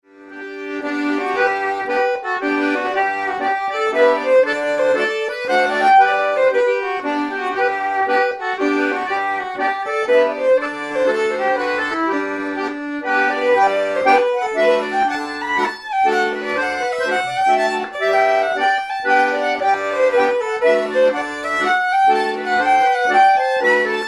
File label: Mazurka